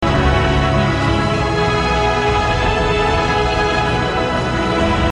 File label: Game Tune